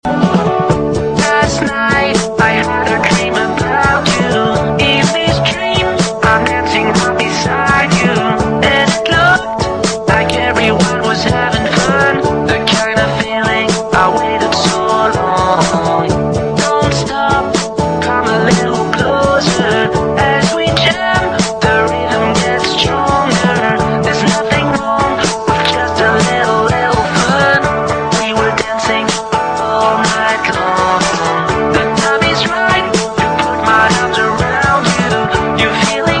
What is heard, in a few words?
Nokia Advertisement